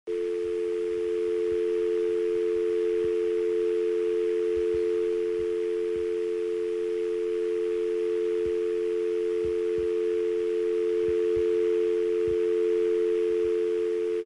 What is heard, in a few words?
dial tone